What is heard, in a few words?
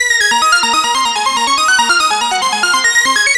ACID LINE; ACID LN